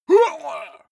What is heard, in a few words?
male fight moan; schreie; menschen; soundpool